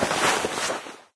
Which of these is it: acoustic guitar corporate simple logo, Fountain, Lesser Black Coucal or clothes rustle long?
clothes rustle long